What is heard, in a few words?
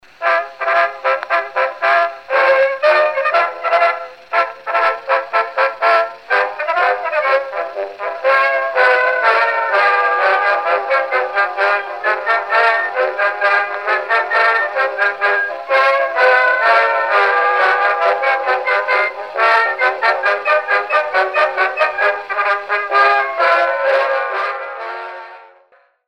coronation bells cylinder